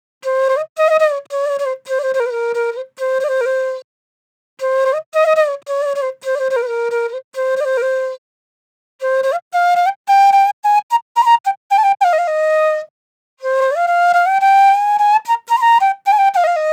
90s Flute Loop 110 BPM F

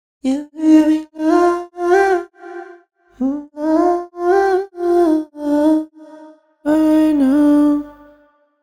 Vocal Loop BPM 100; Bihu; waves of bihu vol 2; Gully Loops

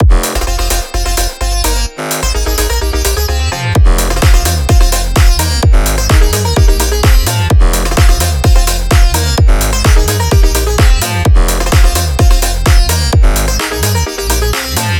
Drop Loop BPM 128 F Min With Drums; Bihu; Speak less; waves of bihu vol 2; Gully Loops

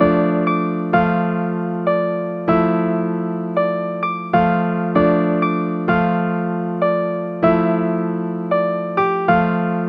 Melody Loop BPM 97 D Min; 4 AM; Divine Type Hip-Hop Sample Pack; Gully Loops

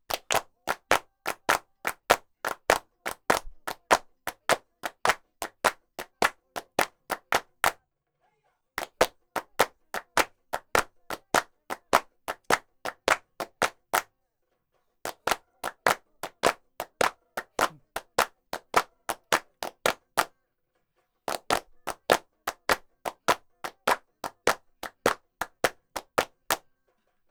Bihu Hand Clap Loop; waves of bihu vol 2

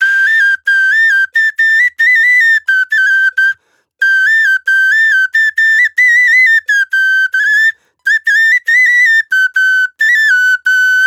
Hutuli Loop A Maj; Gully Loops; waves of bihu vol 1

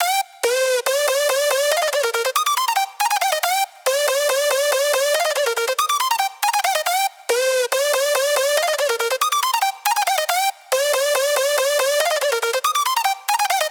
Jump Melody Loop BPM 140 C Min; Bihu; waves of bihu vol 2; Gully Loops

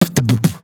Beat Box Drum Fill; Aawaz

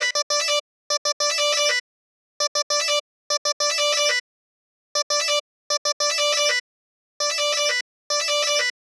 Chop Loop BPM 100 D; Bihu; waves of bihu vol 2; Gully Loops

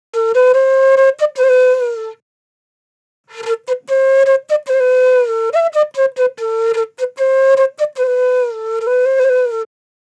Drill Flute Loop BPM 145 A Min

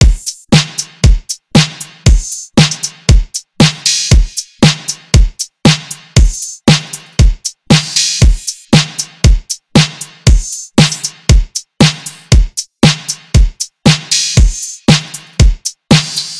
Drum Loop BPM 117; Bihu; Dillon; waves of bihu vol 2; Gully Loops

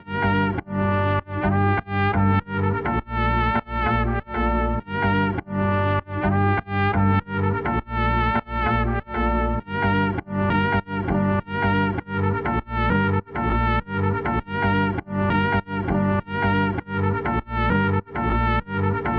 Melody Loop BPM 100 A Maj; Gully Loops

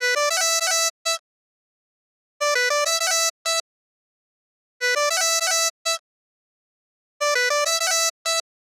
Chop Loop BPM 100 E; Bihu; waves of bihu vol 2; Gully Loops

